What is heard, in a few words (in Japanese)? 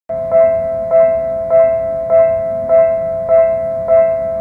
fumikiri